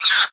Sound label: Monster Slime type Death; Inotia 3